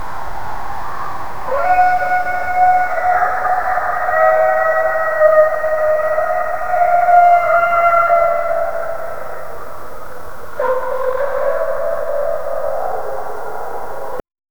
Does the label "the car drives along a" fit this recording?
Yes